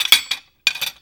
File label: DISHES